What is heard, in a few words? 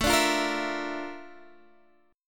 A sharp Major Sharp 11th